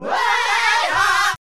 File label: Group vox